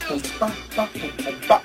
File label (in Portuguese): tamborzao; “pum”, a primeira batida do bumbo; “pa-pá”, as duas batidas slap da conga aguda